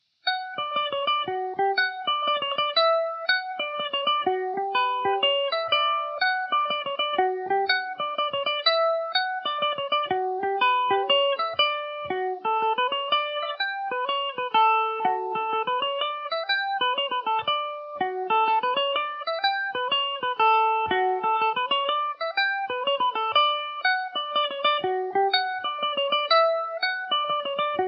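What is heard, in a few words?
old pitch D major, irish mandolin; Revelation Jaguar electric mandolin; The Old Pitch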